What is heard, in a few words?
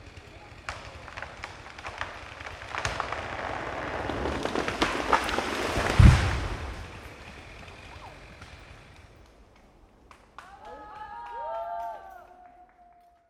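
sound of felled tree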